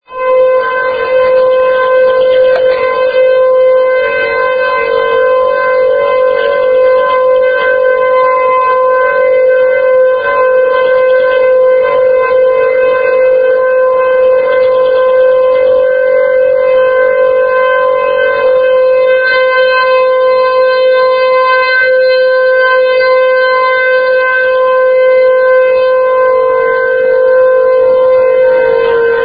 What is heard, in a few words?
Linn County Tornado Warning Sirens